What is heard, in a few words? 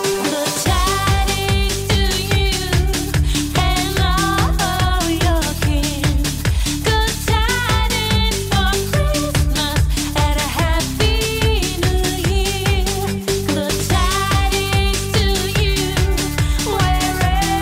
Happy Christmas Ringtone; ringtones